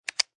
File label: Pistol Semi Auto Clip Inserted Empty; Pistol: Semi Auto, Clip inserted, empty. Version 1; Gun Handling; Lynne Music